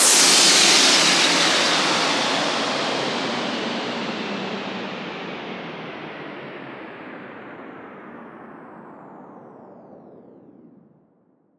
FX Downlifter; Sample Banks; Percussion

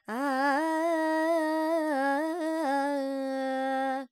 Charukesi, Vocals, Carnatic Female, Alaps, G, Dry

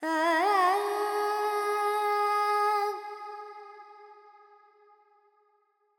FX, Vocals, Carnatic Female, Alaps, Charukesi; Sample Banks

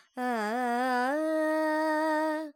Phrygian, Vocals, Carnatic Female, Alaps, Dry; Sample Banks

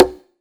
Perc; Sample Banks; Percussion

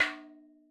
madalam notes L

